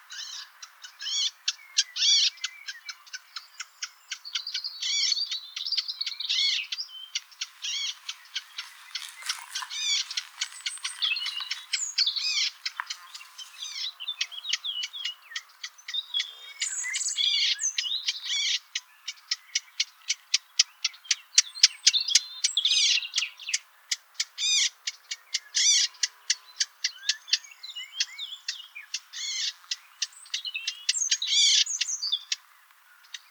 call, Blackcap, The typical "tack" calls are combined with an unusual nasal call. Background: Robin, Blackcap and Blackbird.; sylvia atricapilla